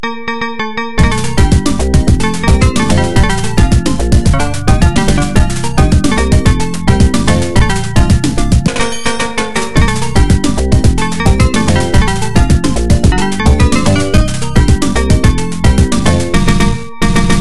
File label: music